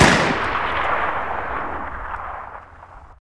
30cal shoot

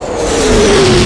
arty incoming; WeaponFire

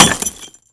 grenade impact

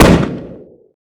grenade shoot

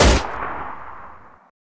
c96 shoot

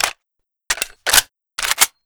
m1 carbine world reload, weapons

